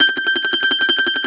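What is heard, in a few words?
Mandolin tremolo, Plucked Strings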